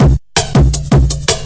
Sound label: 162 bpm flange, drum loop; 161 to 180 bpm